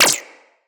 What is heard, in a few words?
Shield Impact Default; Warframes; Volt